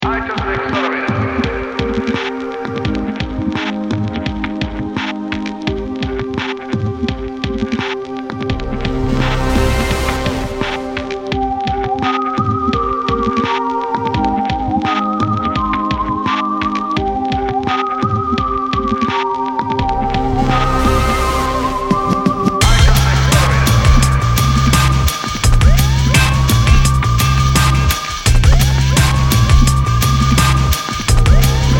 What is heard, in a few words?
desert